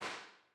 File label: Royer 122, Impulse responses